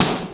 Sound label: Snr Industrial; Zero-G; Soundclash